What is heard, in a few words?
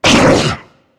charger pummel; player; voice; attack; source engine; left 4 dead 2